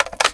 ballista clip out; new weapons; scout; cstrike

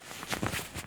deagle cloth; new weapons; deagle tiger; cstrike

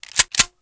famas forearm; weapons; cstrike

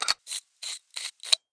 usp silencer on, weapons; cstrike

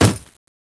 c4 plant; cstrike

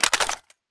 Mag Out; new weapons; sg552; cstrike

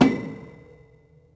ladder; cstrike

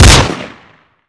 elite fire, weapons; cstrike

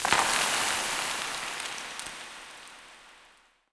dirt slide reverb, mines; cstrike

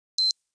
c4 beep; weapons; cstrike

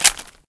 famas clip out; weapons; cstrike

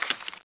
c4 disarmed; cstrike

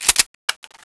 g3sg1 slide; weapons; cstrike

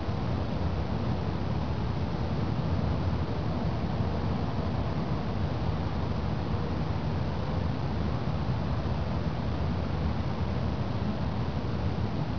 city ambience; cstrike; de anal

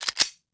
p228 slide release, weapons; cstrike

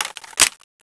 awp deploy, weapons; cstrike

